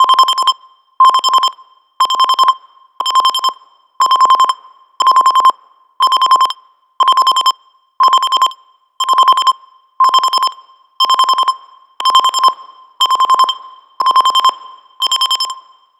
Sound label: Alarm Clock; Canonical; Ubuntu-Touch-16.04-OTA-5